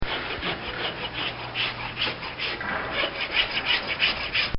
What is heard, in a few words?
Blacksmith Filing A Horses Hoof; Bobby Cole